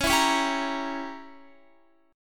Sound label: Db Diminished 7th, Guitar; strummed